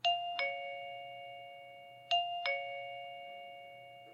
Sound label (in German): innenstation